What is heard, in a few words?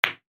ball hit ball, pool; pool8ballmania